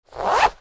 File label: HOUSE